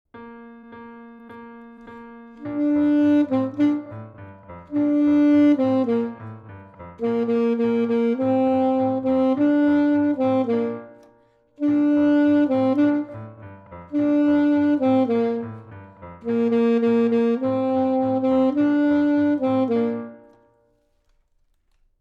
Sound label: Now We Sing Alto sax; Suzuki